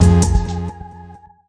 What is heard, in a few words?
wronger rave hit, Melodic Stabs and Hits